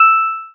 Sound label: chime